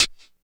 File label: STE HAT; East West - Phat & Phunky